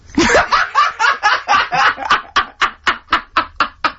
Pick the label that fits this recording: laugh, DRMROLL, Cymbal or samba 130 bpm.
laugh